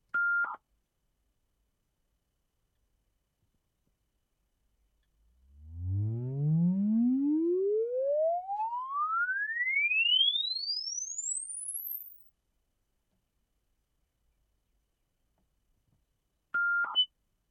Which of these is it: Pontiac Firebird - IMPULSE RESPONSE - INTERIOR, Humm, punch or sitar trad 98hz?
Pontiac Firebird - IMPULSE RESPONSE - INTERIOR